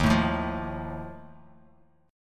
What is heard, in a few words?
D sharp Suspended 2nd Flat 5th, Bass Standard; strummed; JGuitar